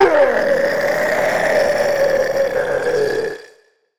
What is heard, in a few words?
Bowser Roar; Odyssey